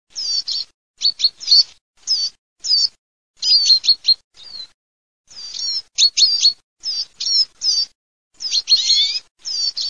LUCARINO FRINGUELLO

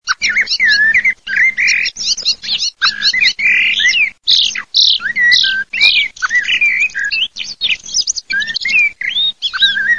merlo tordo

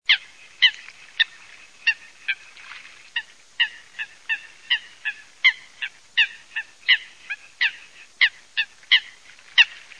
FOLAGA

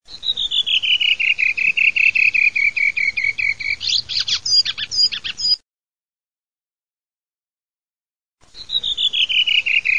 sassello

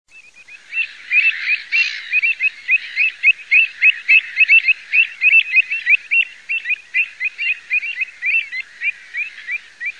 avocetta, birdsound

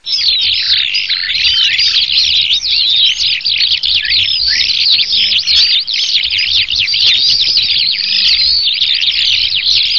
allodole, birdsound

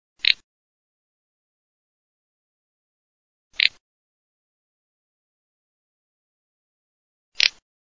otarda, birdsound

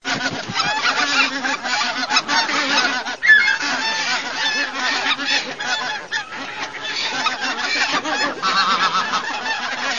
OCA DARIO, birdsound

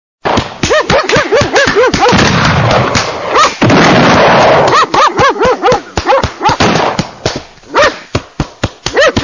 cinghiale

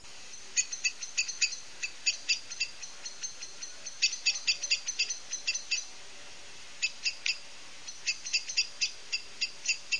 MERLO AUTUNNO noise, birdsound

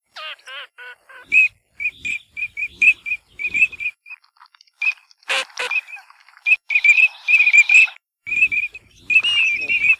ALZAVOLA GRUPPO

